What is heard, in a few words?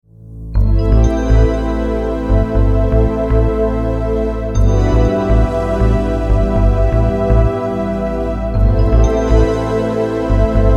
Hohner HS-2e; Short-Demo